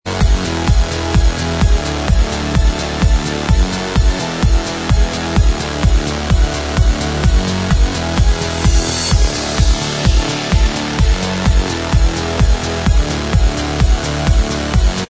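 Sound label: Instrumental Track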